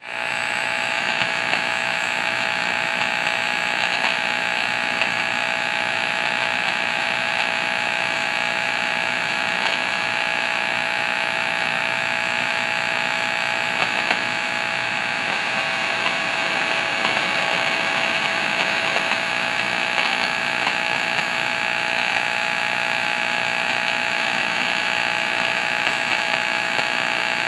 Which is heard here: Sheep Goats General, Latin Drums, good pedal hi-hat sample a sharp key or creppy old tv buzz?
creppy old tv buzz